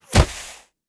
bullet impact sand; Rust

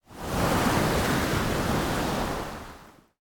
ambient battle; World Of Warships

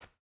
footstep burlap cloth jump start; Rust

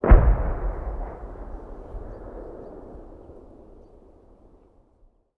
Amb Destruction; Red Orchestra Ostfront 41-45

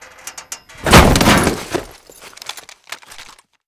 relaxed guard chair fall; Call of Duty Modern Warfare

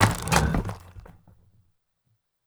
stone construction; Rust

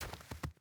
footstep boots snow walk; Rust

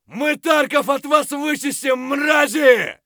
fight; Escape from Tarkov

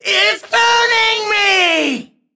Alarms Fire Alarm; Starscream; World Of Warships; Entertainment

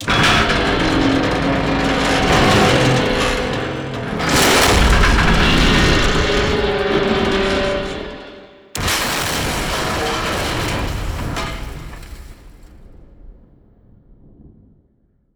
power tower crash; Call of Duty Modern Warfare

